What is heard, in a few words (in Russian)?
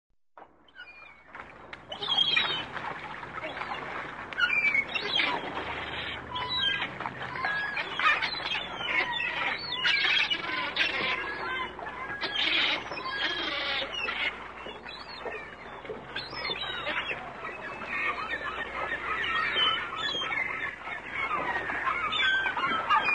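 крики чаек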